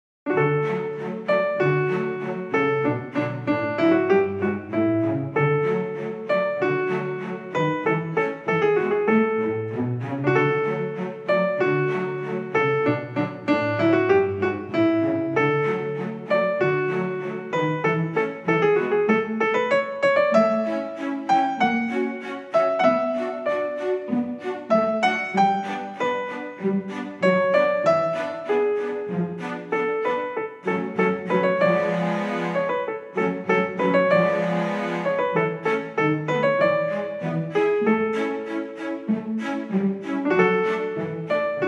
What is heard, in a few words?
Theme for a One Handed Piano Concerto